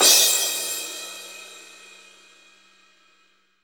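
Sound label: cymbal crash; K-Crash st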